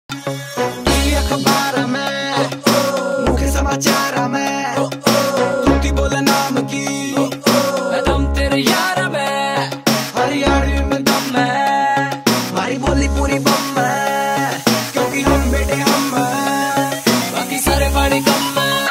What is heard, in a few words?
Haryanvi ringtone